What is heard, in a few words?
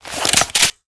shotgun draw